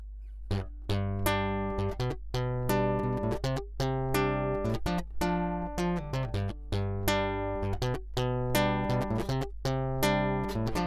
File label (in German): Strumm Piezo